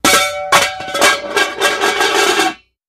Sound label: Hubcap Manhole Cov, crashes